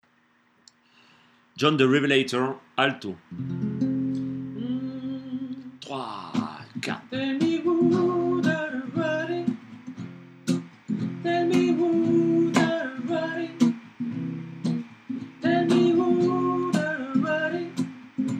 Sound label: alto; jon the revelator